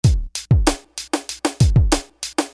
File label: Beat, Hip-Hop Street Beats